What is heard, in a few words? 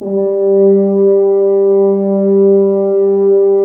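BRS French Horns Dry; Roland LCDP06 Brass Sections; F.Horns 2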